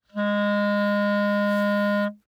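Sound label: Eb Clarinet fortissimo Ab3 stereo; Woodwinds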